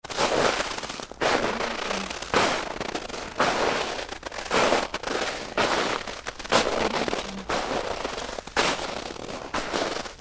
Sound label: footsteps in snow